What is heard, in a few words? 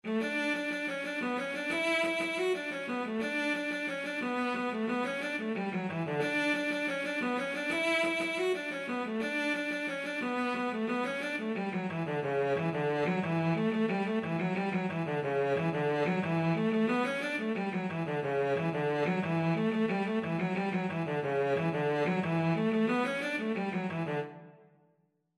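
violoncello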